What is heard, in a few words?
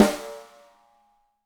Snare, Roland TD-20